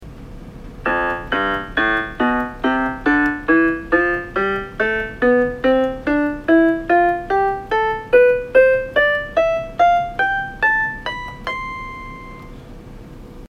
casio sa76

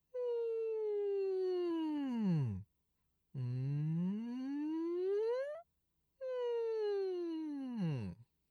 practice small voice

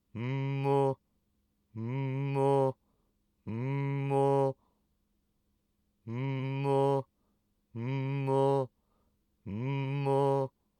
inspiration chest voice

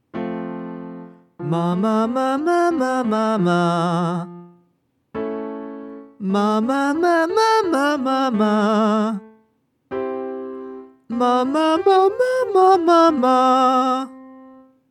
mixed voice consonants

